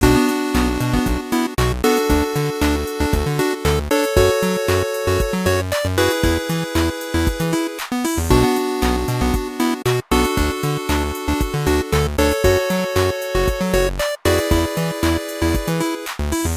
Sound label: funk